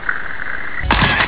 camera

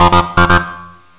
ringing

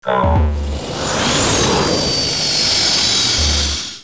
speed+; Revista CD Expert 42; funrun

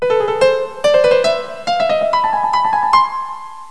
PIANO; Pipo-Aprende musica con Pipo

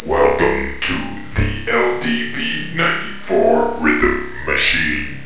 RHYTHM, DRUMMACH; Leonardo The Inventor; Broderbund; Riverdeep

